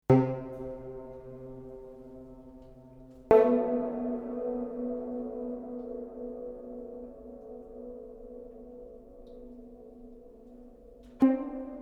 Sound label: Soundscape